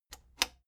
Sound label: plastic button latch scratch disable slow